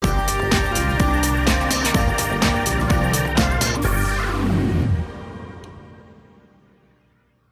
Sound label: Game Lose; My Little Pony Magic Princess; Equestria Girls